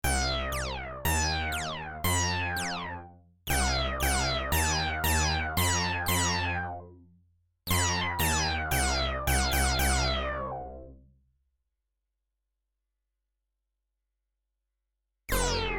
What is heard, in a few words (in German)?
system1 rechts sh5 links sync; roland